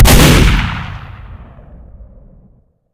scatter gun shoot; tf

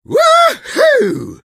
taunt exert; engy; tf; taunts

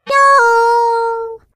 turret launched

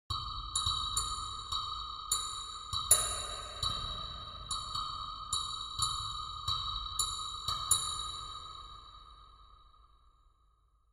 ambient synth grain click

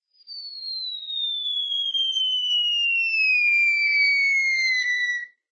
falling object whistle; cartoon; hannah barbera cartoon sound fx

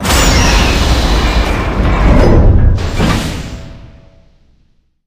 chamber door open quick

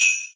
xmas sleigh bells

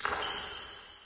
distant drip, water

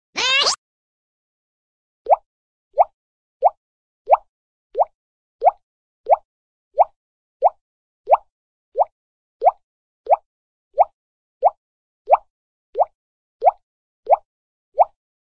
waheep, cartoon waheep

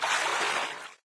wade, player footsteps survivor walk; source engine; left 4 dead 2

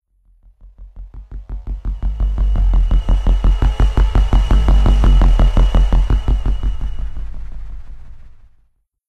pod pass by; ambient; outro; source engine; episodic

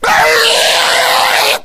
rage at victim, infected, male

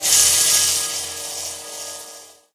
lightbridge elec, footsteps

